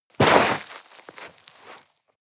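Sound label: step gravel land hard, footsteps; schritte; menschen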